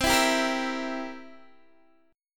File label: C 7th C down Guitar Open D